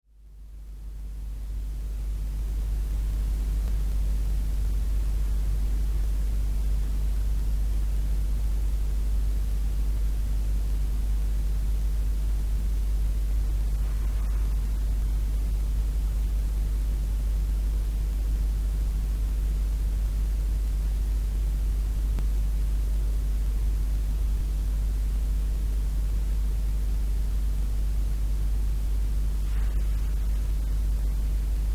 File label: thunderstorm glen iris